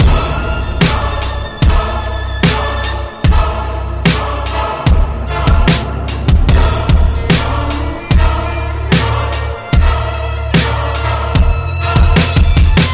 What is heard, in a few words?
song